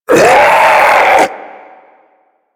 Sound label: Infested Ancient Pain; Enemies; Warframe; PussAncient